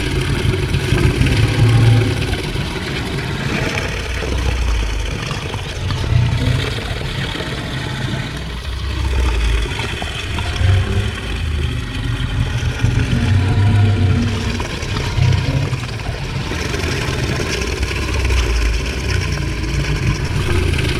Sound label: creature brute shark chase loop